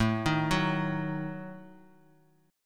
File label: D sharp Diminished A